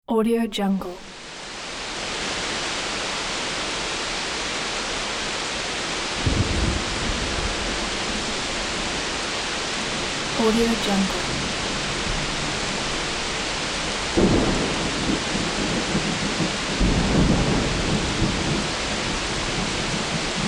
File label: strong rain with thunder